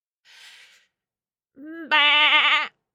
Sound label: bahhhh